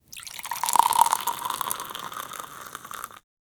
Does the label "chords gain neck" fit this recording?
No